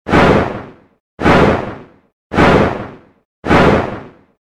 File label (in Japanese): モンスターの羽ばたき 4回 遅め モノラル